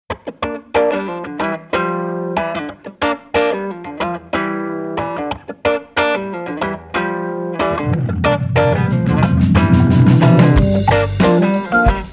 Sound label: downbeatclub